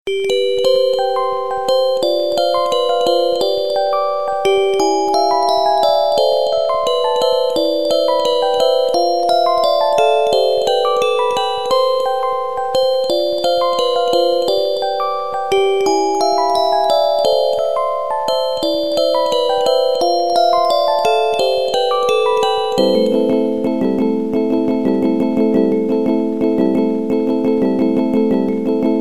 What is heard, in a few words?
Music Box